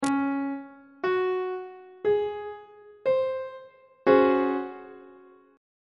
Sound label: c sharp major 7th suspended 4th chord; treble clef